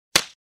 Slap

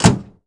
TRUCK

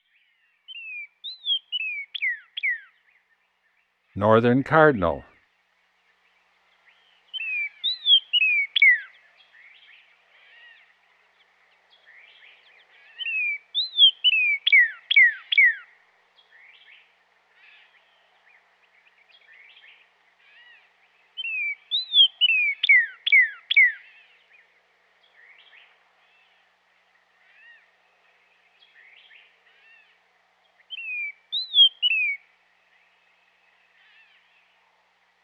Northern Cardinal; Western North America; Dan Gibson; Wildlife Identification By Sound

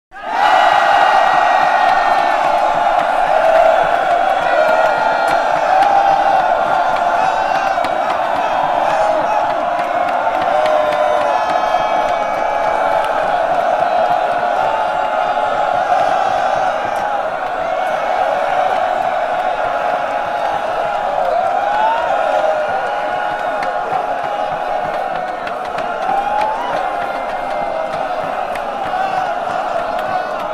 Infantry Roars 1000 Men Roar; Historical; Hollywood Edge Historical Series; War Horses Armies & Weapons

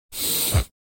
Sniff Close Inhale Through Nose, Human Sounds

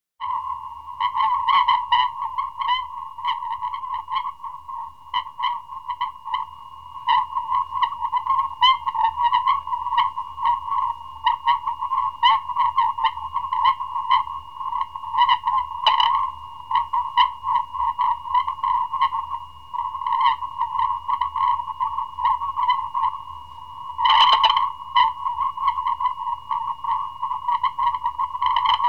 Sonar Pings; SOUND EFFECTS1

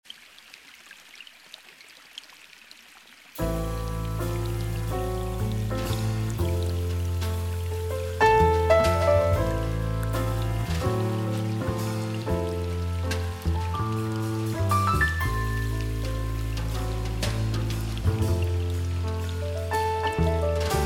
Jazz

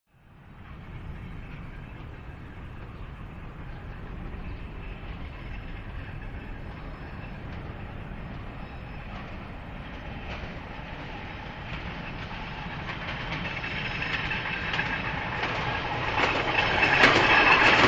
Train By (Fast) Electric Train Fast Past And Away; Hollywood Edge 3-D SFx

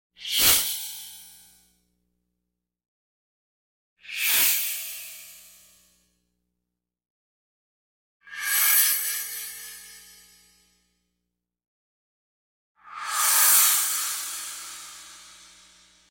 Whoosh - Short cymbal swell x4; Hollywood Edge Sound Designers Tool Kit

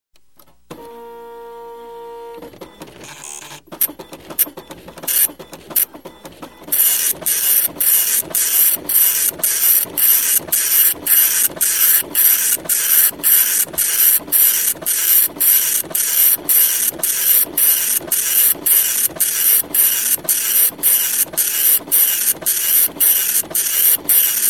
printing from the computer; SOUND EFFECTS1

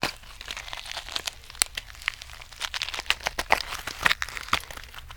CRUNCH, CARTOON; 1000fx

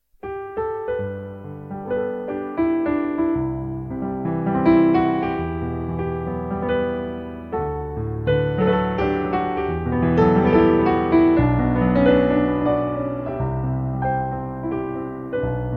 Irish melody; Dmitry Krasnoukhov

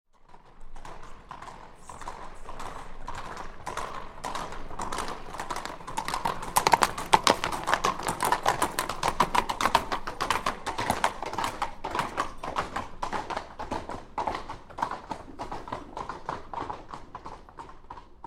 Two Horses Gallop By On Cobble; Historical; Hollywood Edge Historical Series; War Horses Armies & Weapons

